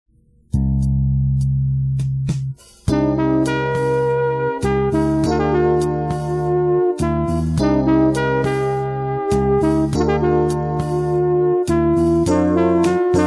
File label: Cool Jazz